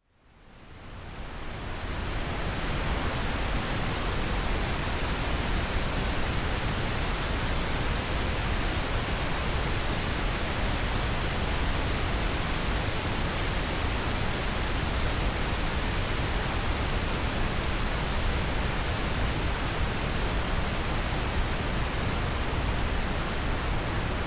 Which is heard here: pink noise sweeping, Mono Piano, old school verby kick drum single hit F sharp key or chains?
pink noise sweeping